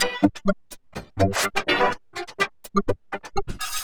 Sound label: Granular, LOOP 125 BPM; Bolder Sounds - Granular